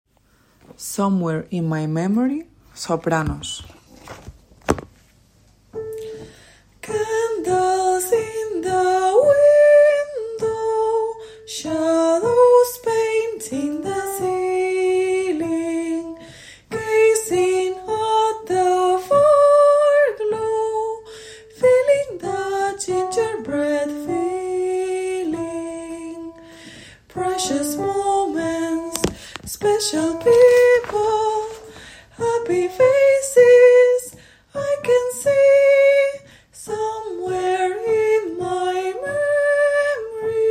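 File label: soprano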